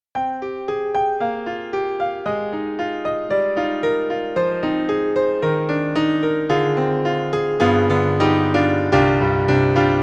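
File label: Yamaha C7 Close Live On Stage, piano; Steinberg; The Grand 3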